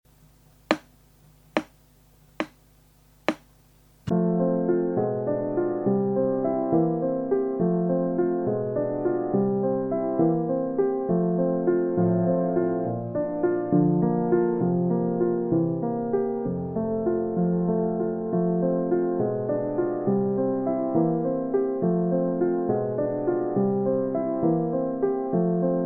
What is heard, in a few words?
piano; Von fremden Laendern